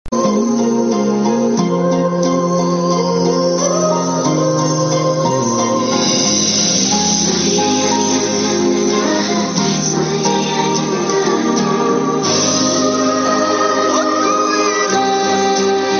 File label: background music